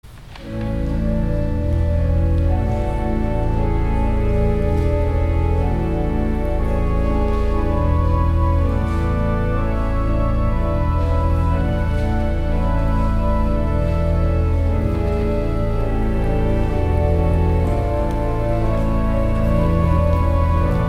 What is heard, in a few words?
Organ, Chorale Prelude; St. John's Cathedral, Jacksonville Florida; Marcel Dupre; 'In Dulci Jubilo'